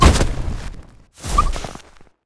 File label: yoink